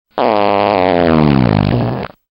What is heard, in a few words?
Fart